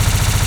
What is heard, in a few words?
Plasmid Machinegun Loop; GUNAuto; AlientAttack.MonoGame